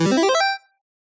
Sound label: Ball, Skee-Ball; TheStable; Pyralix